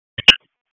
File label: EMS ALERT; wake